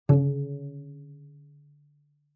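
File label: Cello pizz fortissimo sul C Db3; MIS Pitches - 2014; Strings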